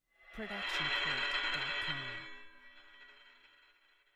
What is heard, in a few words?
horror metallic rattle; soundscrate